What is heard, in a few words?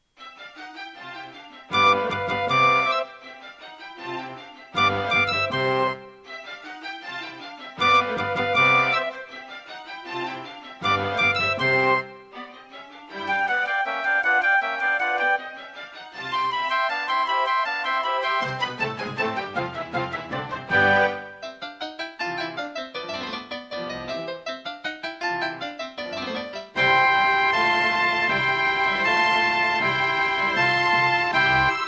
Creative Wave Blaster II; classical; Mozart; Concerto K467 partial